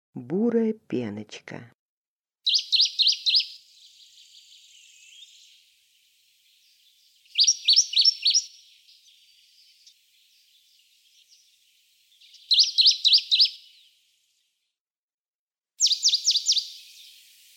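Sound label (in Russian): buraya penochka golos, dusky warbler voice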